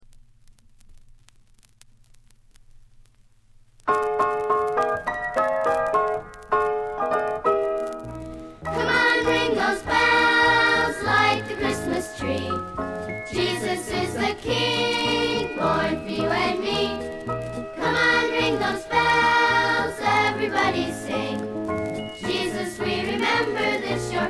Choir

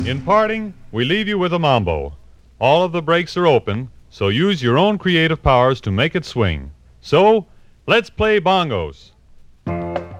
Breaks Mambo Accompaniment

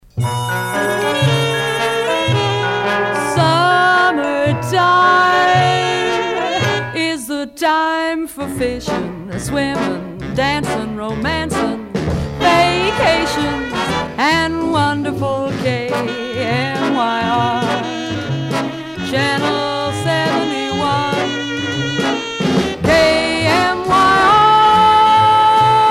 Radio Station Jingles; Demo Reel

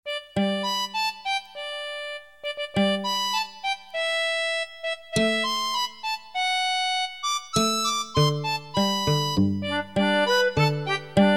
Jingle Bells; Special Ed Xmas

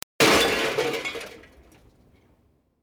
Metal Crash

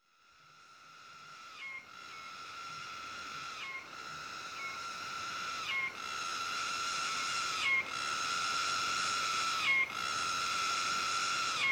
Malaysian Rainforest Cicada

